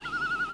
loon, ambients

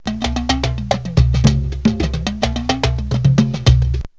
BATUCADA; BigMax nº 9

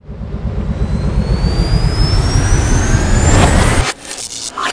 POWERON, terminal

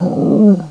purr, dogz

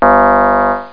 beep

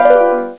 flower; Spider-man Cartoon Maker; brushes

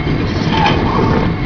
gears move; objects; Heretic II Demo

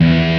dist E3; DirectX SDK; DMusProd

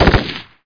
M4 3 shot; SpecOps2 MPlayer Demo

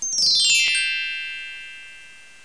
Gliss; Bible Fun Pack; NOAH

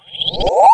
SPELL

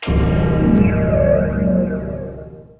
shrine; Heretic II; items

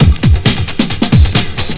breakbeat; AlgoMusic; Rhythm

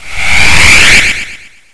eco

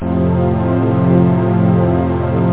string

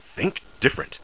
ouch; 3D Klondike 1.0a

